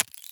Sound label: step, headcrab black movement